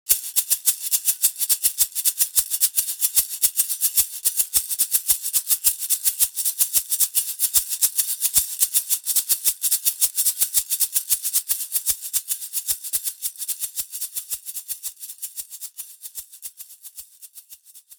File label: ganza